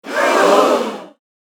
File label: Audience shocked gasp sitcom; Crowd